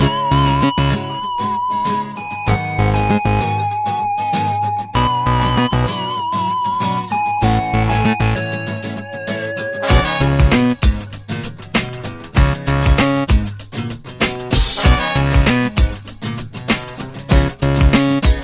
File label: Urgent Call